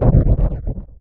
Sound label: Processed Perc